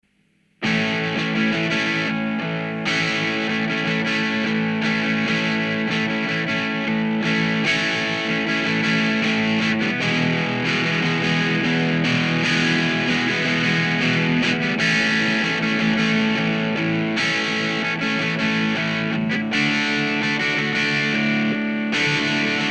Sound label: ENGL POWERBALL